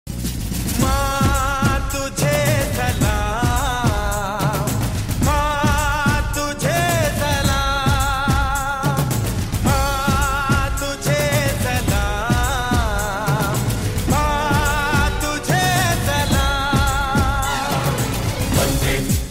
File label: Desh Bhakti Song Ringtone